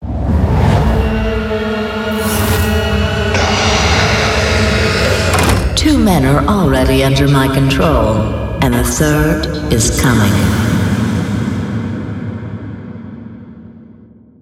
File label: cut scene; Ghosts of the Past Bones of Meadows Town; valkiris